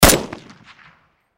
Gun Rifle, 7.62 Caliber Dragunov Svd Bolt Action Single Shot; Rifle Sound Effects; Close Perspective; Blastwave; Warfare